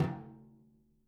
Living Drums, tom; JZ XTOM 1; Sampleheads; Peter Erskine

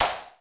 TECHNO PERCS; Crypton - DrumBase5000

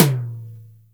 TOM, Drum Dry; East Collexion; Drum 1

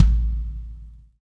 KIK, Reverbs; Club-50 - Foundations Roland; Verb 8

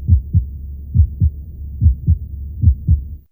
Heart, Fairlight CMI IIe

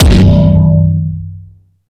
base drum; DRUMS

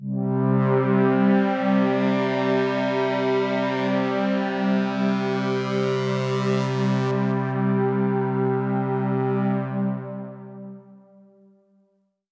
Pad C, Synth Prophet One Shots; Electronisounds

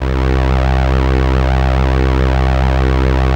SIEL, String Machines

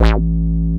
moog A; Techno Trance Essentials

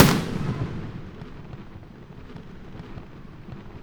FIREKICK; Spectrasonic Distorted Reality 1; BIZZAROS

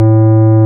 ORG, Farfisa

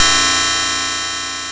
cymb, cymbals

